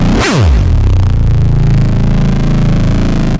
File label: Acid Sounds A, Synths; Vengeance Samples